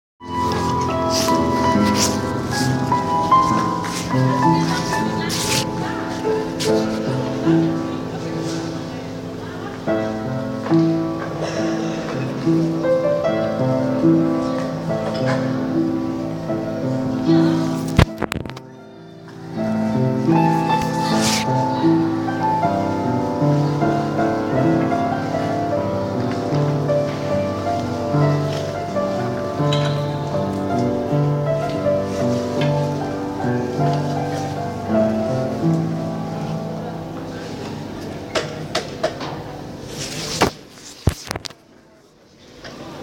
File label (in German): Klavier; Iraker